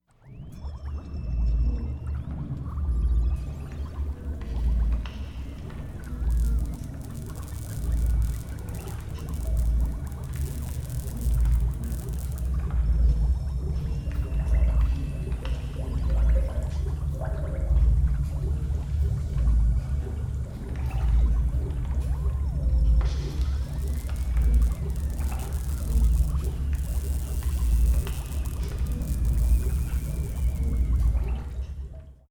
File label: ambient